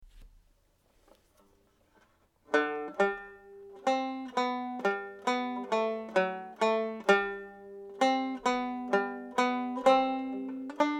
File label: open the door for three slow, banjo